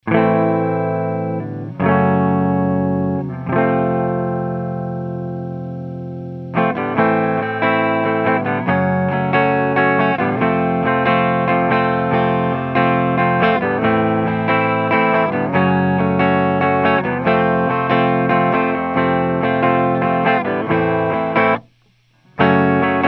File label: AMP Clean 2200 T, clean eguitar; SE Electronics 2200T